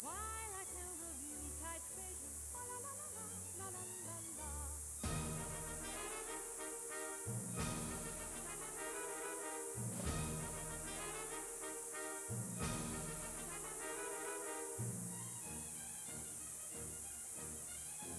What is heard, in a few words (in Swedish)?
sänkt 90dB, sedan kvantiserad till 16bitar, sedan höjd 60dB; Deck the hall